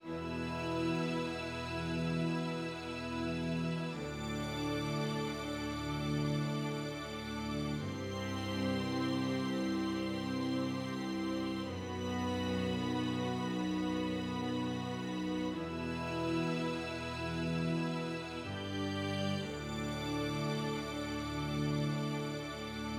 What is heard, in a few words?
synth strings